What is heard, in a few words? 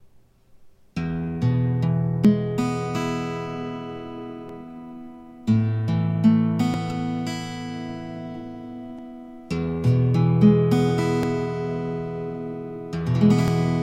mic, acpickup